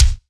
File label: BD, BASS DRUMS; EdgeSounds